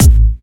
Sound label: Bassdrum